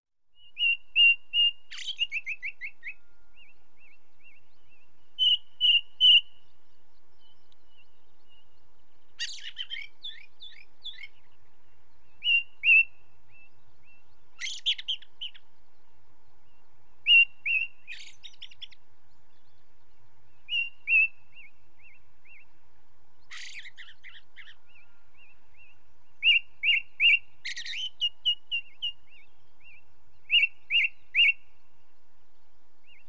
Ring Ouzel song, with song of second bird, red grouse and cuckoo in background; British Birds; The BBC Sound Effects Library